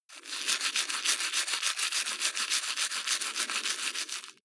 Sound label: teeth brush wet open front circulate